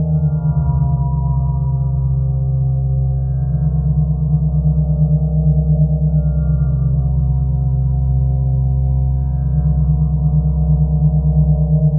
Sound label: SPHERE, D+B, 160, C; eJay